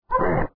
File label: BABY SNEEZE BABY